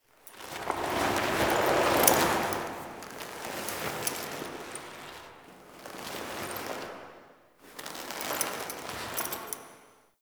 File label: Romance Cin Foley B; Lyon; Warframe; Tau Romance Cinematics